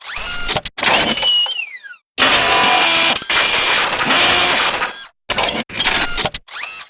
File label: radio fix; pierce